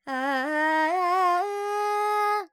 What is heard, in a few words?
Pentatonic, Vocals, Carnatic Female, Alaps, Dry